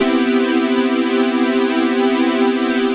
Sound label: Dream Pad (minor 7)